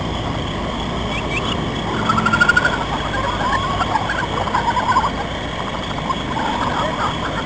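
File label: Jungle Ambience, outside; ambient; valve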